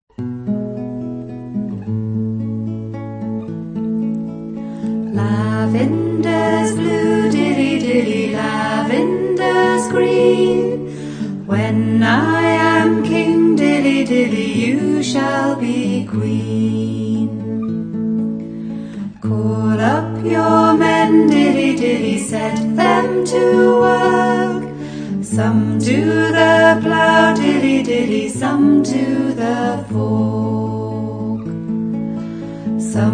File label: lavenders blue piccolo song